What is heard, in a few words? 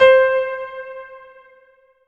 drum n bass; Notes; Piano